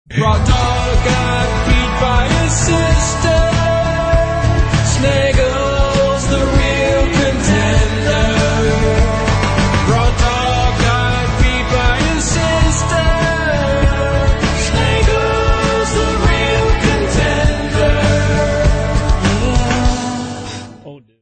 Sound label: Filter song